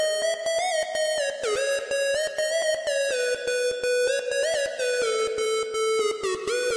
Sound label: Apple Club Mix Ringtone